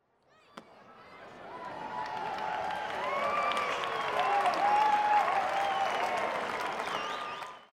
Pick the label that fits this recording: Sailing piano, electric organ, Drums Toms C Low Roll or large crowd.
large crowd